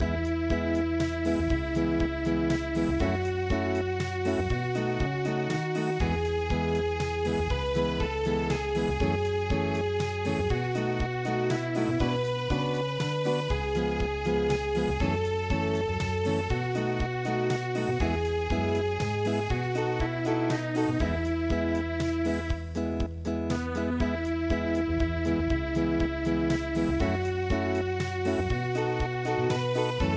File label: Unplugged, midi; Old Mother Hubbard